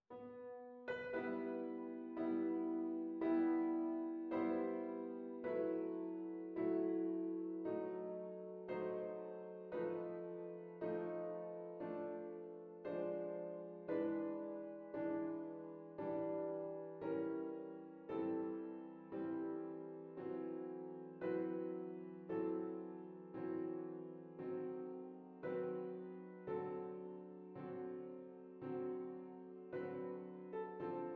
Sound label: Prélude in E Minor